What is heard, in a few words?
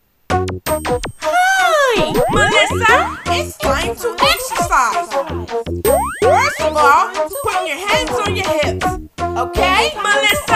exercise song